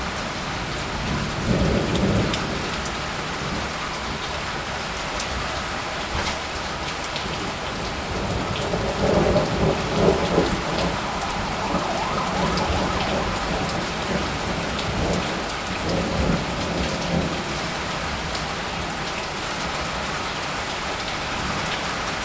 city storm with thunders and alarms, recorded at ground level, during a short spring storm